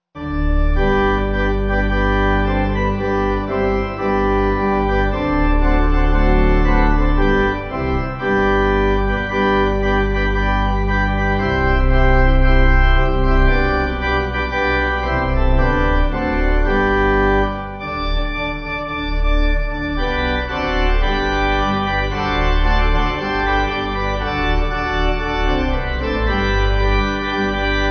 O Where Are The Reapers, Pipe; Root

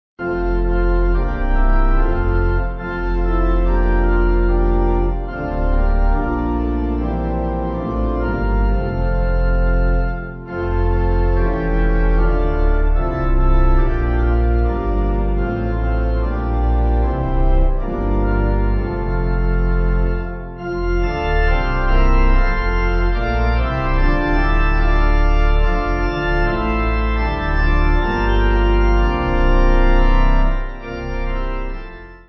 Pipe

